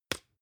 spice jar small open; industrie wirtschaft; gastronomie

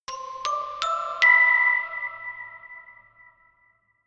notification glockenspiel creepy sequence ascend

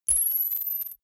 confirm micro click; maschinen; signale; soundpool

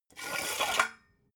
metal scaffold pole extend hard; industrie-wirtschaft

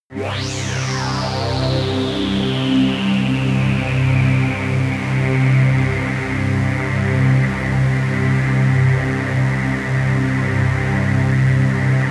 pad sweep buzz; flaechen

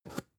spice jar big scratch open fast

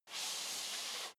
step leather shoes metal dry slide long; schritte

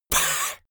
monster hiss flat; koerpergeraeusche; comedy-cartoon; soundpool

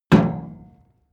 metal impact hollow ringing

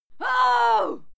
female fight scream

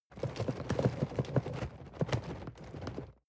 cardboard box medium shake soft; objekte

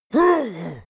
leprechaun gasp hit; koerpergeraeusche; comedy-cartoon; soundpool

